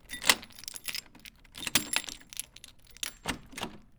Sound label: locking a shop door with keys; mixkit